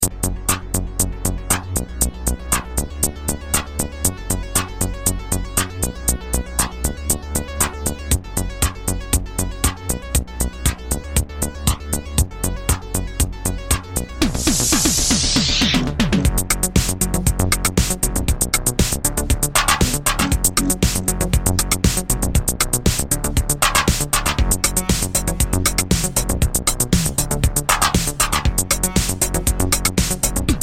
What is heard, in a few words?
synthwave; elettronica vintage